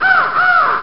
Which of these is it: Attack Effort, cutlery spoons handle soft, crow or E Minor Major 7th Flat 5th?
crow